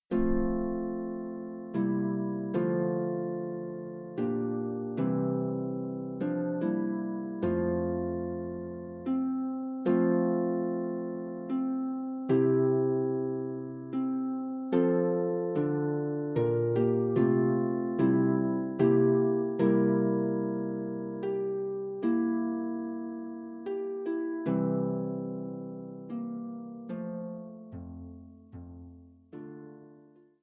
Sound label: Famous Music for the Harp